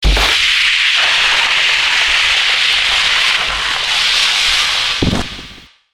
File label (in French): Glisse + saut, Ski